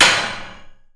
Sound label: hit